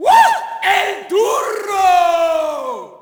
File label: Shout, audience; UFC Tapout 2